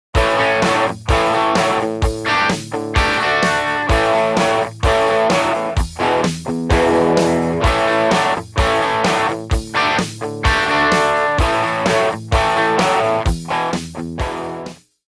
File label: Crunch Drums